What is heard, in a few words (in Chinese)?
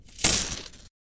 丢铜钱; 环境音; 非循环音(SE); Eustia